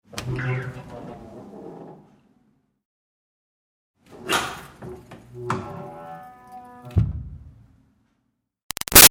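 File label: Door Wood Push Bathroom Groan open-close Fast; Miscellaneous Doors; Big Room Sound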